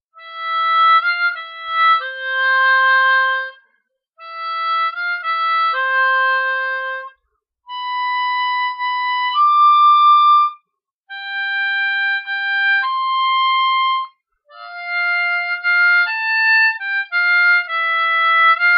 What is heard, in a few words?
Silent Night, 10 hole harmonica